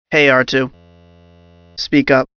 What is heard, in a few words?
speak; R2D2 command